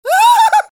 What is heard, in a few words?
halloween scream; vo